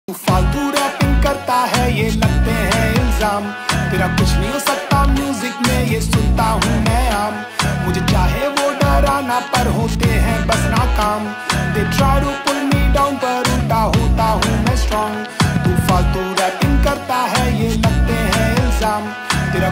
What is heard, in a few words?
hindi rap ringtone